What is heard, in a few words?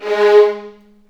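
G#3 violins; The Ultimate String Collection; East West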